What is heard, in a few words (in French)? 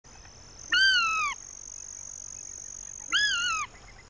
barge a queue noire